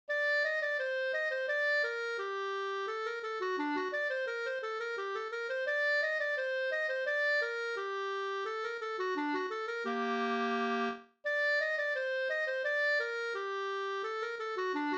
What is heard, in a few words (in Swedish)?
ganglat Ganglat i gammal stil